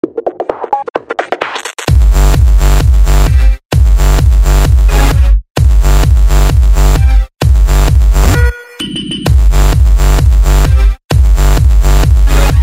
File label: Minimal Electro tech Hause; прикольный трек в стиле Minimal Electro tech House